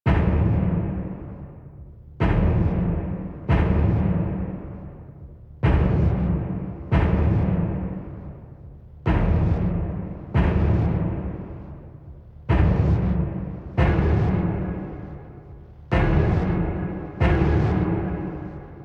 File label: Cmbt Perc, Music; Warframe; DE; Dynamic Music; Sacrifice One